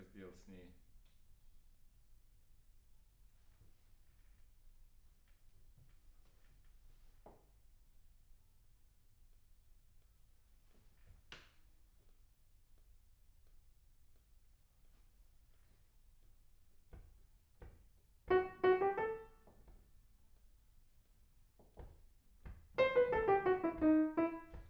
PIANO

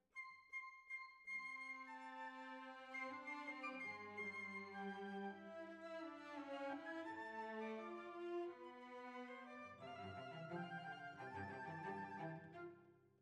Violas, jazz for piano

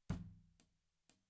SNR Top

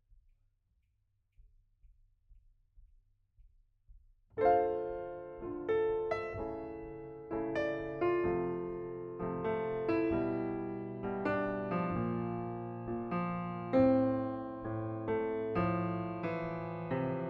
Piano B

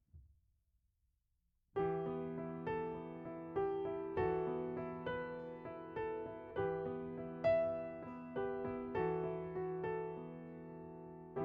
Piano A

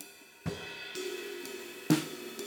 Ride

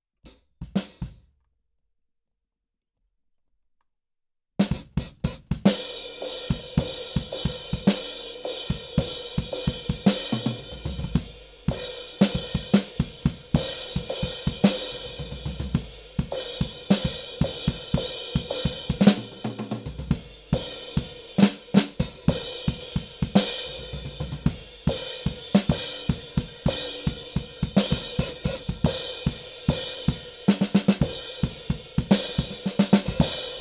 KIT

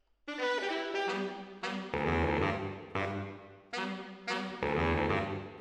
MKH AB, piano

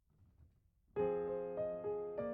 Piano A

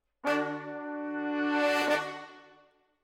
Horns Over

